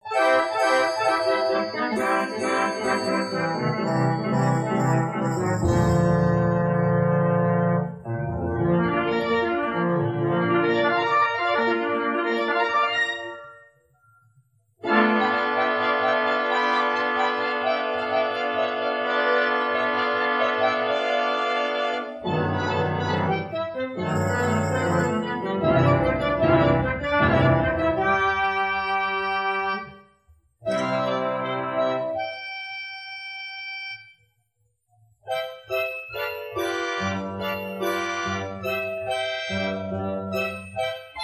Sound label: wurlitzer style K photoplayer